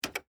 Computer Keystroke, Technology, Computer, Keystroke, Single, Large, Terminal, Keypad, Vintage